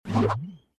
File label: candy throw; tak bites